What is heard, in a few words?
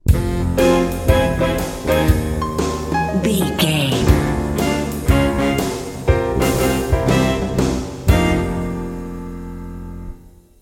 jazzy stroll bumper